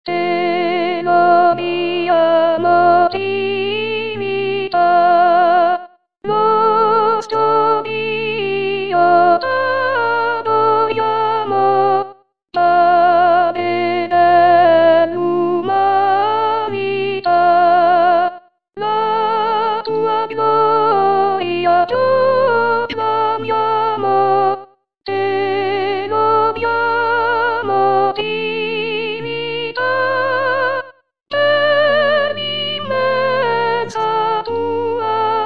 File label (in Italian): Te lodiamo Trinità soprani, voci guida; corali in vaticano 2018